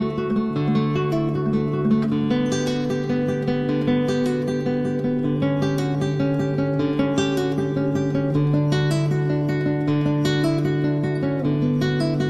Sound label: Cello Suite No. 1- Prelude